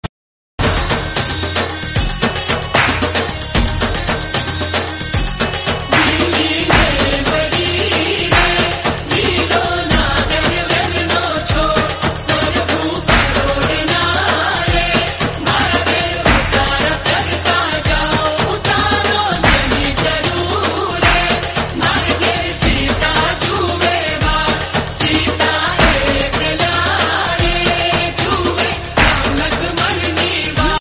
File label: Nagada Sang Dhol